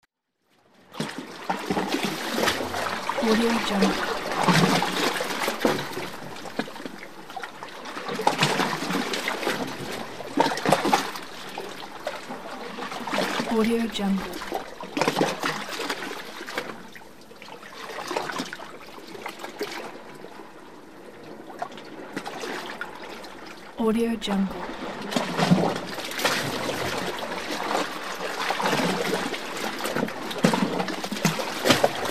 waves swash in the grotto

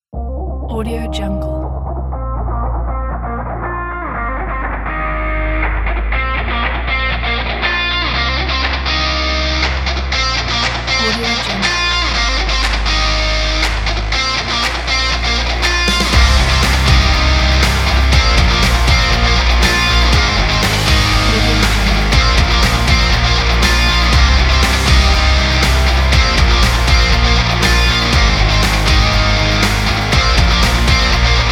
action sport upbeat rock

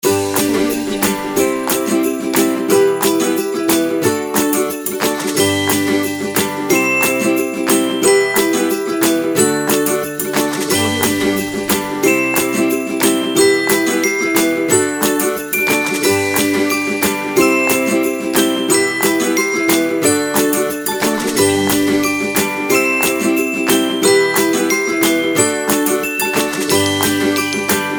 happy christmas

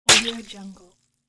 wet splat

